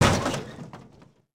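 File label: metal destruction, Impact; Cars; XBOX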